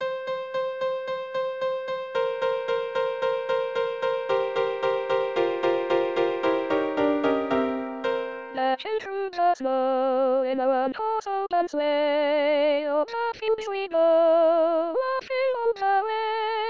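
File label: jingle bells, soprano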